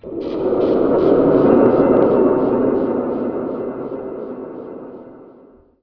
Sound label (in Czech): Kamera